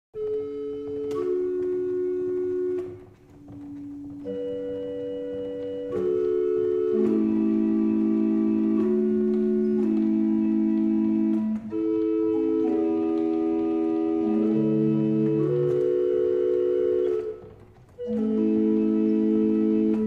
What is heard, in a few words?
barrel organ; Liddington